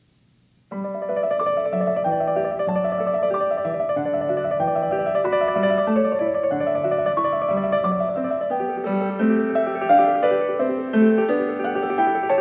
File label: Variation 1 a 1 Clav., PIANISTS; BACH; GOLDBERG VARIATIONS